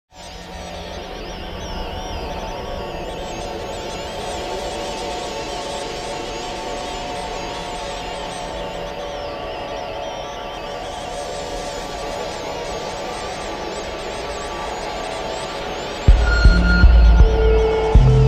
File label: Electrostatic Interference